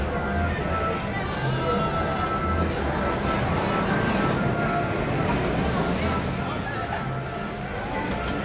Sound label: Fun Fair Ambience; Totaled; XBOX